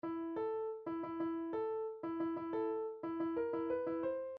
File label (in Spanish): alarma, midi